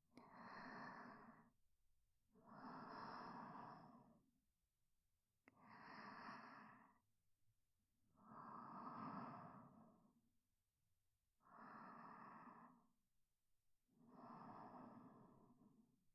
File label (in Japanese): 寝息 ループ; 耳舐めセリフなし